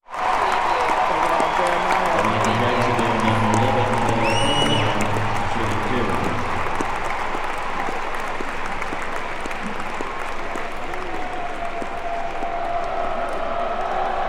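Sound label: Arena Crowd Applause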